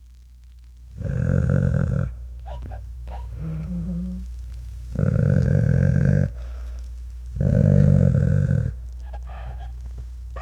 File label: low pitched dog growling; constant